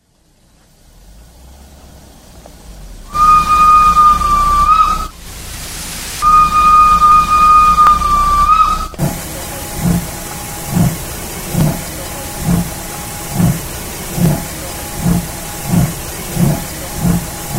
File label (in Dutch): Stoomfluit Trein